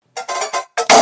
jingle

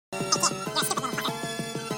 kid

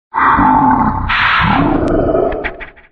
headshot

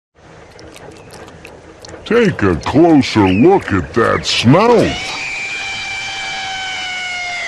reee

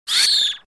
scream

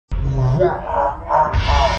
wahahahah

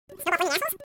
snowball

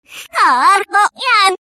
clock, reverse

